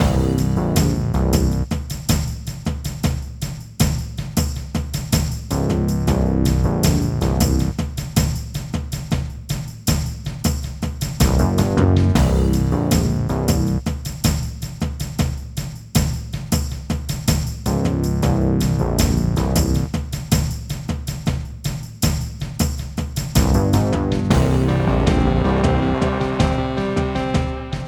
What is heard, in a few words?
Wasteland Loop